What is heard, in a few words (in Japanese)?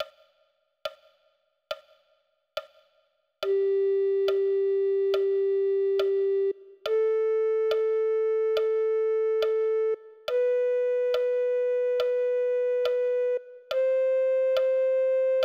リコーダー; 「ド」の音; ゆったりとした リズム; 「ラ」の 指に して，左手の 人差し指を はなして ください。それが 「ド」です。